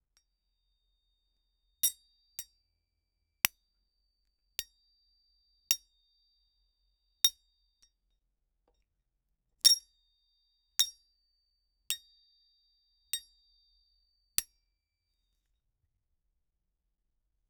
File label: snapping steel cables gui, musical instrument